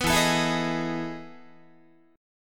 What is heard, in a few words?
E Major 11th E, Guitar; EM11 strummed